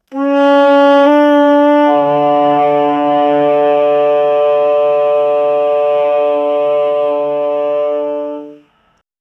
single sustained tenor saxophone

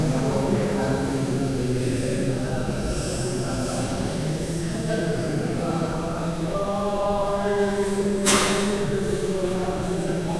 a big crowd of people, praying

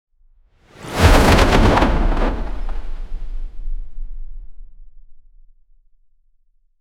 fast wooden object throw

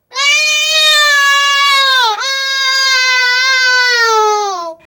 hardworking child

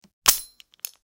light bulb break

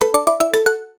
win game quiz or victory

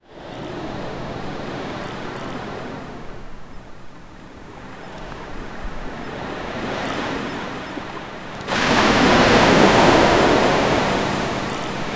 ocean waves crashing on

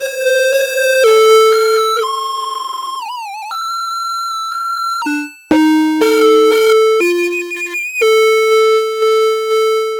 bird pokemon sound

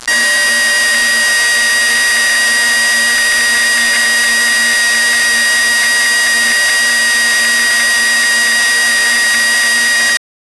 radioactive beeping, dissonant

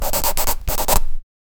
quick scribbling of a pen; writing

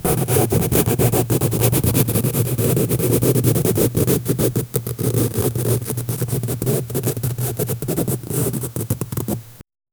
fingernails scratching; ambiance

